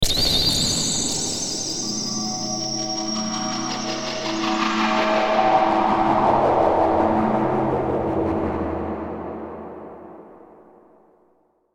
Eerie Squeal Feedback Delay; Warped; Blastwave

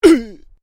MALE VOCALIZATION UGH; Exclamations; Voice

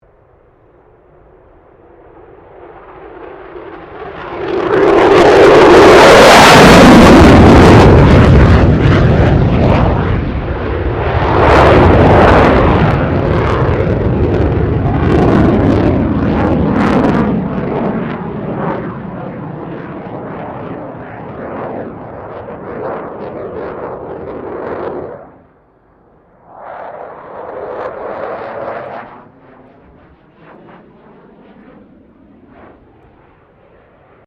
F16 Falcon pass; Airplanes; Vehicles

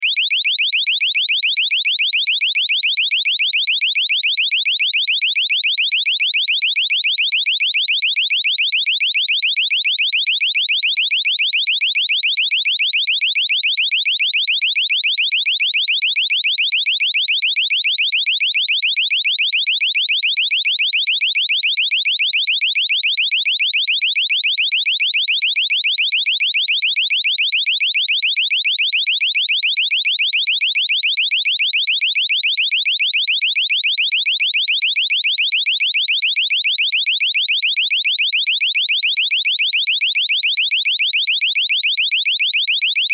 Alarm Burglar Security System Beeps Loop; Emergency

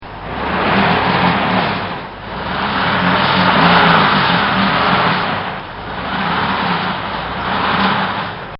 Car Stuck On Ice

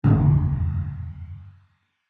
Hit Phase Hollow; Sound Design; BlastWave

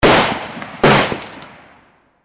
warfare gunshots x2 rifle exterior; Rifles; McKinney Sound

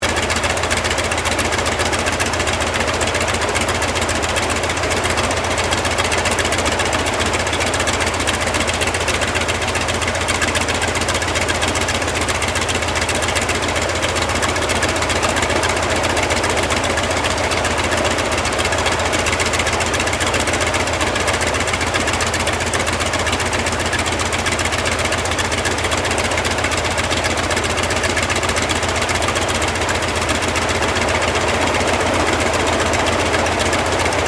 AIRCRAFT CAC WIRRAWAY IDLE; CA-16 Wirraway; Airplanes; Vehicles

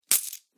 Coins Impact; Money Sound Effects

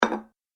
Medium Side Plate Placed On Dinner Table; Dishware Sound Effects; Household

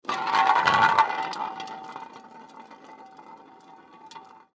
Aerosol Can Roll; Rolling; Foley; Blastwave

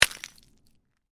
Ice: small chunk of ice lands on hard surface; Snow Sound Effects; Stuart Duffield

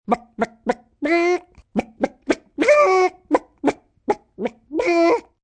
Chicken, Animal sounds, chicken (human voice); VoiceBox

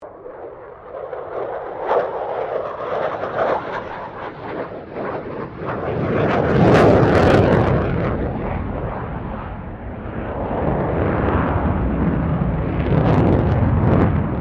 AIRCRAFT F16 FALCON MANEAUVERING; Airplanes (Sound Effects:Vehicles)

